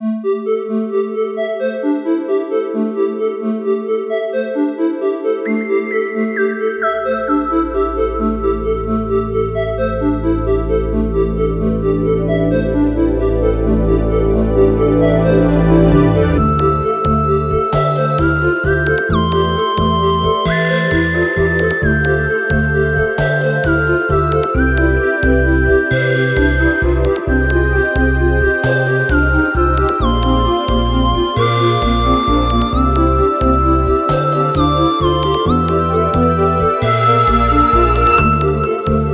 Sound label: dungeon; CrossCode; Switch PS4 Xbox One PC macOS Xbox Series X Linux; Rhombus